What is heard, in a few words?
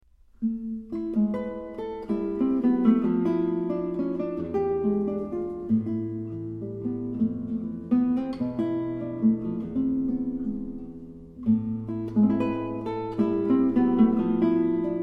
suite III, flute, guitar